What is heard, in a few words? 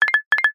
cartoon blink; zapsplat